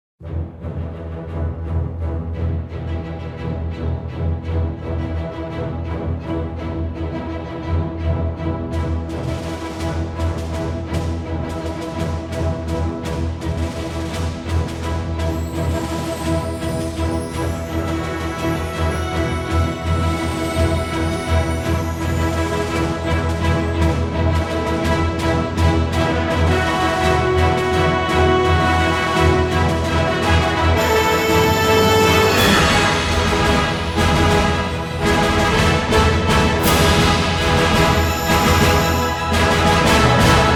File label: Fanfare; Overwatch